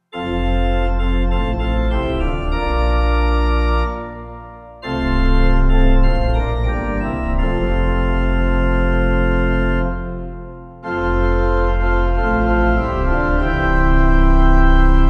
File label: Mude Bin Ich Pipe; Now The Light